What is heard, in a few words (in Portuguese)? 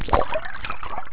Gravação som da água